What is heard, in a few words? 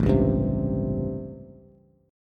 A 7th Sharp 9th A, Bass; strummed; JGuitar; 5,4,5,5